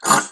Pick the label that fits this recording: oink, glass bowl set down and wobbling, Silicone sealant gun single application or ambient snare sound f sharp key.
oink